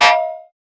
sharp metallic ping brigh

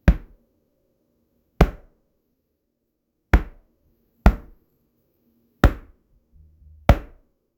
the sounds of two pillows

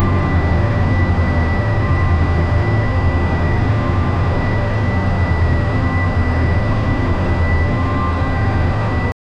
heavy iron machine slowly, machinery

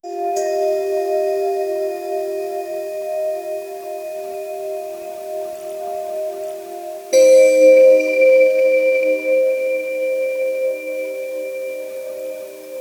medieval forest at night, moonlight

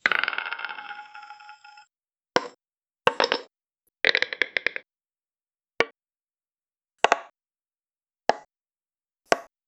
soft block clearing sound, puzzle

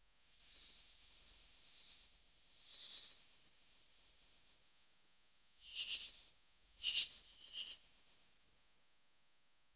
create the sound of scrat, texture

